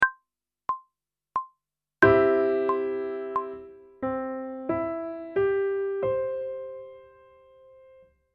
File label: Major Arpeggio one octave ascending, pop vocals; Grade 1; Technical Work